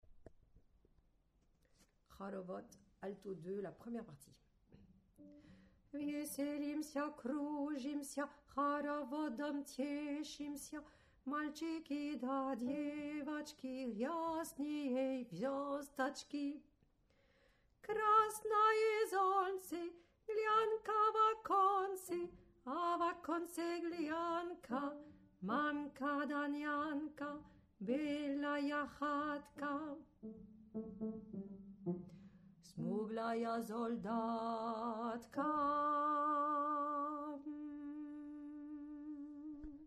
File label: Alto; Haravod